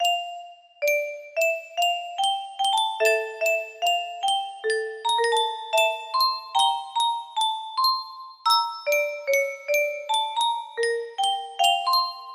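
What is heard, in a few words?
music box melody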